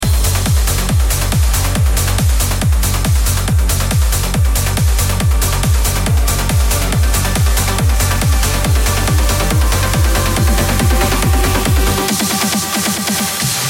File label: Uplifting Transition, No-Talking DJ Mix; Uplifting Only 670